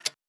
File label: Settings Button Check